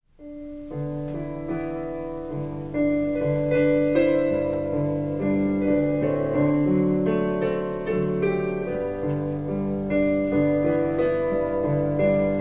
Piano prelude

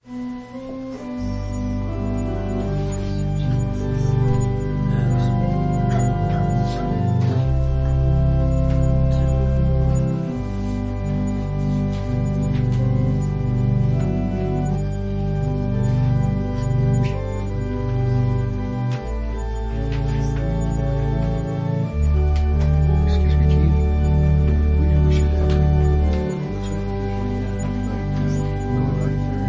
Organ prelude

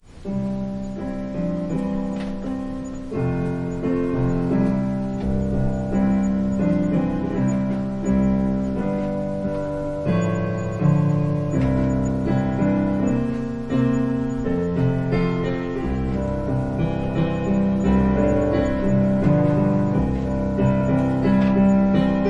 Piano prelude

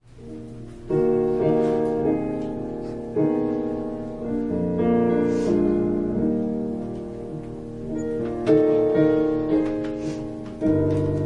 Piano prelude

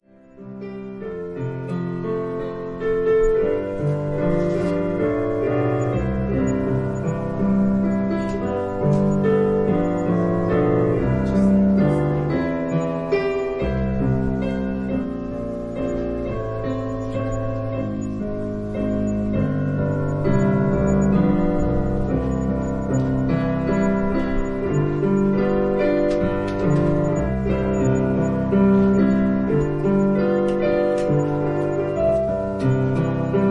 Piano prelude; Put off your grave clothes

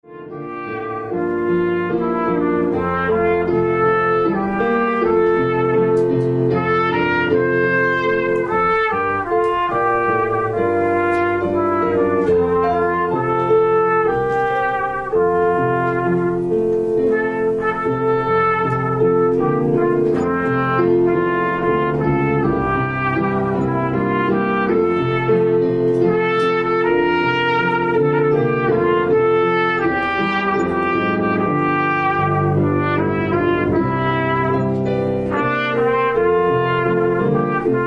Piano and trumpet prelude

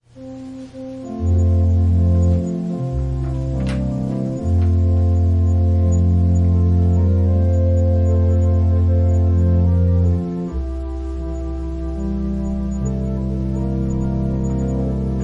Organ prelude

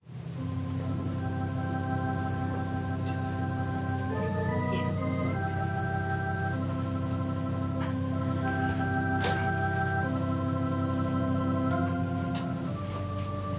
Organ prelude

